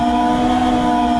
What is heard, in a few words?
step